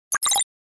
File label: glitch gameboy